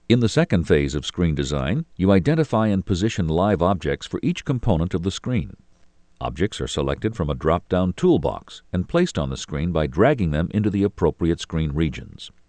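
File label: phase, techno bass drum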